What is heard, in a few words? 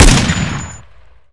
SR25